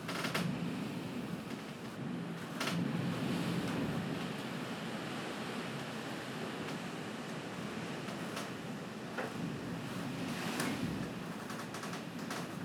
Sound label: wind noises